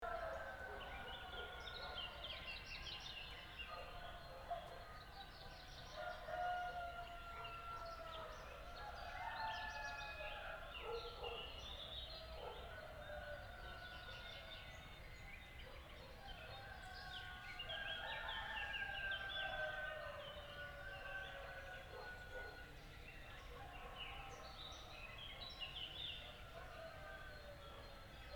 birds and animals before sunrise

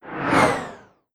whoosh wind sweep

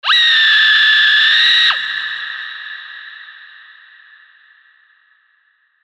screams of agony

